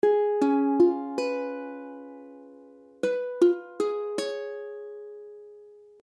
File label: Cis, ukulele; C#7; akordy